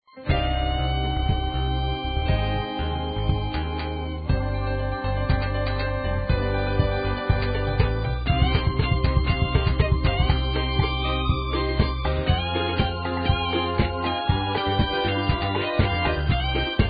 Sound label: melody